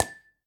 block tile wheel

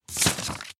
tile flip

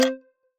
clock tick

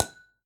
block tile wheel

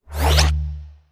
space tile flip

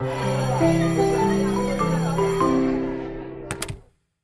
game intro

